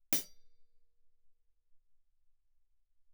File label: hihat, normal, ff; Percussion; cymbals